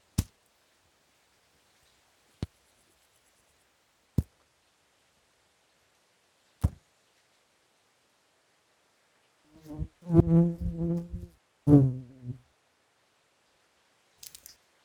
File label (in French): Insectes étang